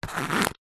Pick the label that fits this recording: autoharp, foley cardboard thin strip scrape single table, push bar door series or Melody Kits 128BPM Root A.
foley cardboard thin strip scrape single table